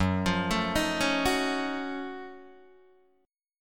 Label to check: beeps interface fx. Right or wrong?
Wrong